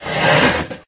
snd Chair Drag

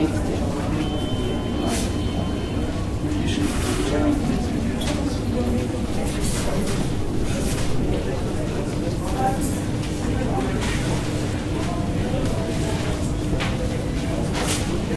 amb Waiting Room

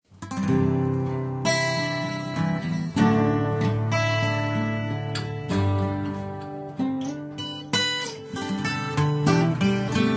music Relaxed

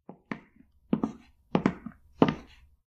Footsteps Tile Closer

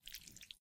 Wet Squelch

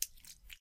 Wet Squelch

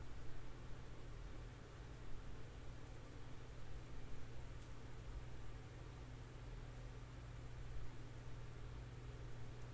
amb Room Quiet

